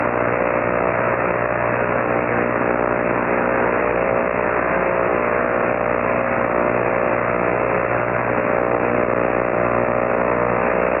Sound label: RFI tuning